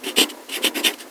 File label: Drawing with pencil and marker